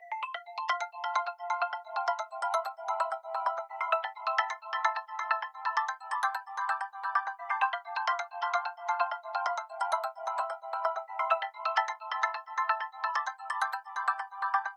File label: 130 BPM A Min Bells; Melodies; Loop Stems; Pharaoh Premium Drum Samples (BETA); Cymatics; Cleopatra